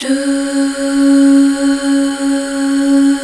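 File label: Duh Female; Best Service Gigapack 1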